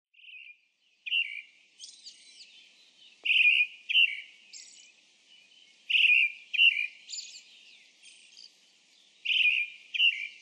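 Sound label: Brown-headed Thrush; akahara; nikkou; koe; hirano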